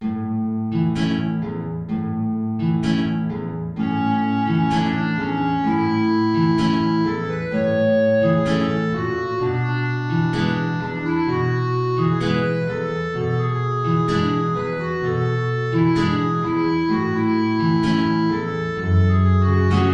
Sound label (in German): Tango Klarinette in Bb Gitarre tief